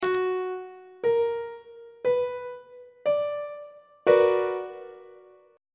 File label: c flat minor major 7th 2nd inversion on treble clef